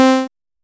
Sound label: heal sound short quick in, pixel